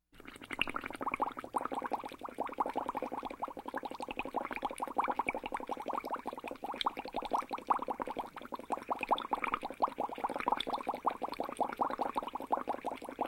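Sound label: boiling bubbling water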